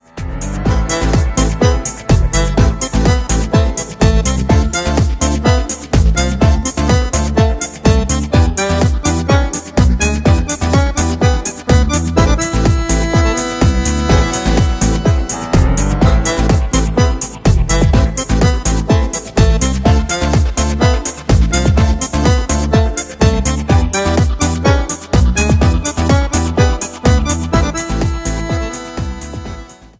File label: ambient tango